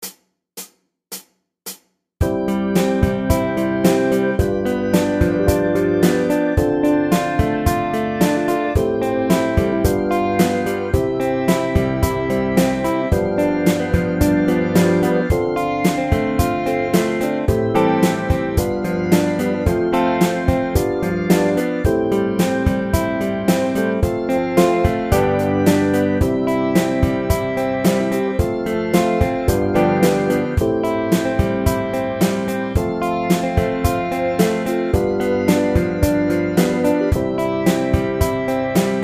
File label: Backing Track; Creating Basslines